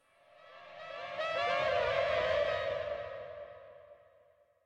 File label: Noise